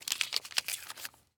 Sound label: Bandage Wound; Medical; Blastwave